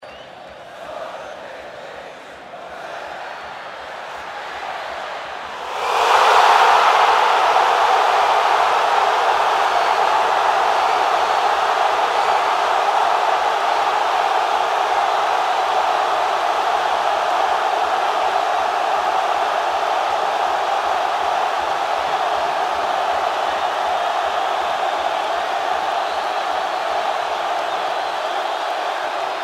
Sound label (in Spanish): PARTIDO DE FÚTBOL GRITO GOL BARRA; CLÁSICO ALIANZA VS UNIVERSITARIO; ALIANZA LIMA